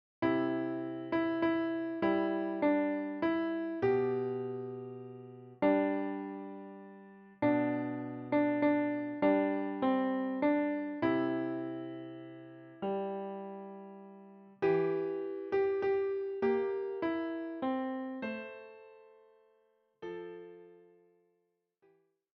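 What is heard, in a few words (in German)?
Süßer die Glocken nie klingen Klavier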